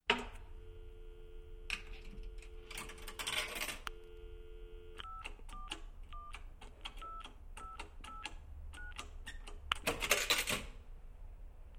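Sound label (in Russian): Zvonok po telefonu, telephone ringing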